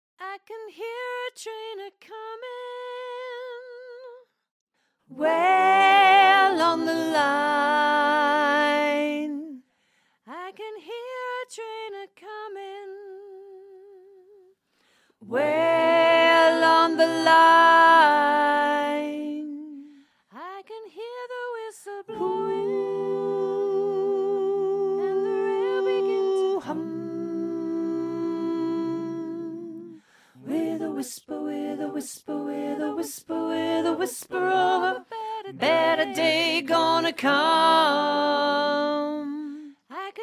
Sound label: I can hear a train a comin Alto